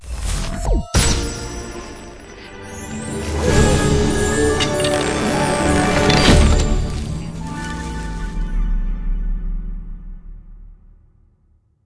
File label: candy win reveal medium; fairyhansel